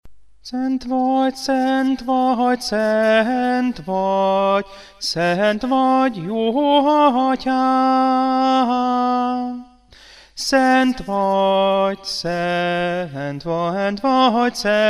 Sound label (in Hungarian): szent vagy tenor